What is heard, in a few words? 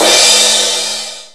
sci crash; drumtraks